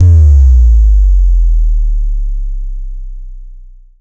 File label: Kick One Shot, Drums